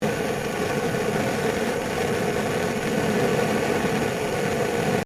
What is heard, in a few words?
Torch Welder Run, Scifi, Torch, Welder, Run, Loop; Science, Fiction; Tech